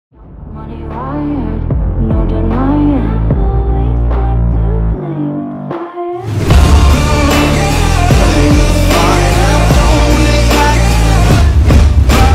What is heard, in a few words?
Mixed up ringtone